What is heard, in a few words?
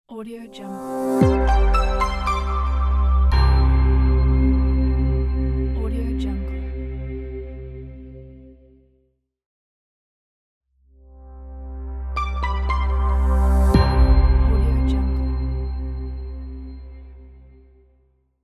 minimal elegant logo